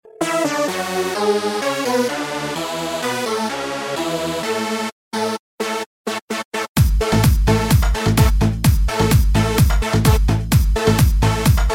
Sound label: Nokia Forward; Ringtones